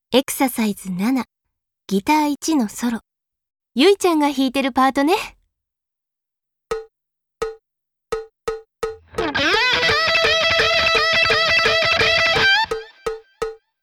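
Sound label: Guitar I Solo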